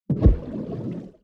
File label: quick stop; netent